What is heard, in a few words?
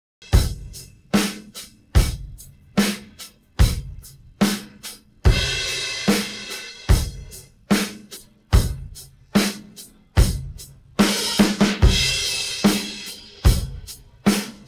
pattern, drums